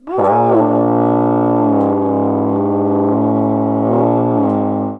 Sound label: GROWL; E-MU Formula 4000 Series Vol. 1; Hip Hop Nation